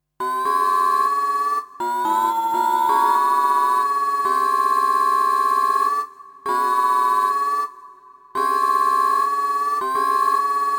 night owl

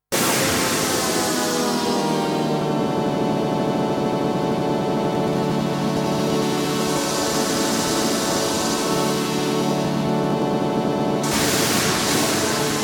Descending jet engine

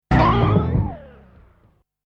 flaring gong

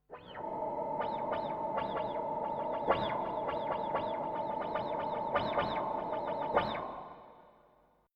drain atmos

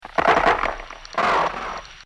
lofi crunch

